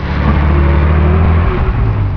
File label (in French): accelere, tank; svencoop